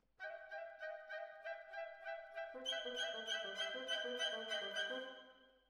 Violins Over

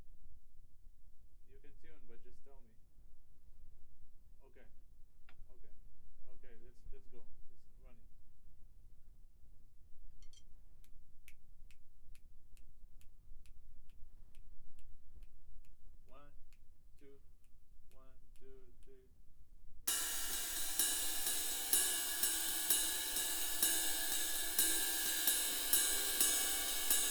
Kit

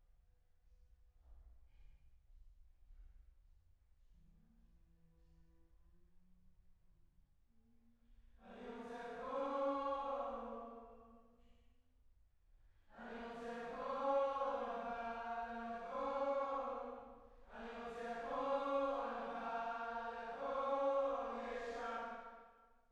Choir

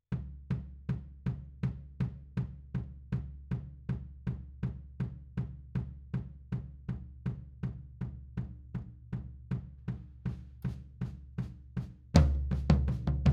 Floor, 160 BPM

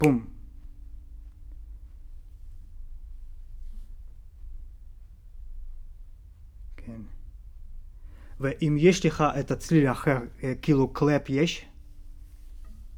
Tape GTR

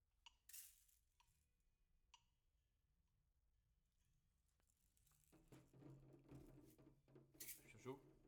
shaker

